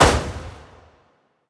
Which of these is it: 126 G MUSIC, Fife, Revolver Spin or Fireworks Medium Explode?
Fireworks Medium Explode